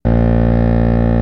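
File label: Electric 60Hz Hum Loop; buzz & hums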